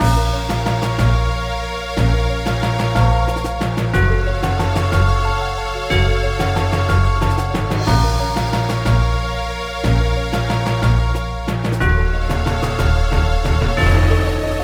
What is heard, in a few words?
Bgm lobby postmatch; survivalrun